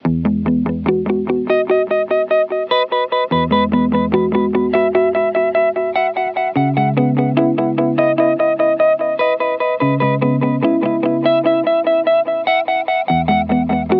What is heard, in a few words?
H90 Guitar Head Space